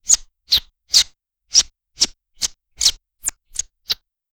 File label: simulate the sound of a, beauty